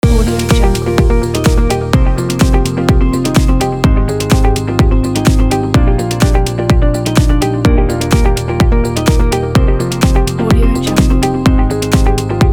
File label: the corporate loop